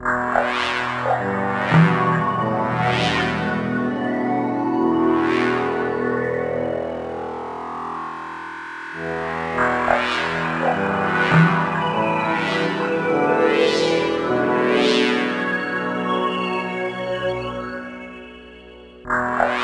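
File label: music hangar ambience loop; RTX Red Rock; PS2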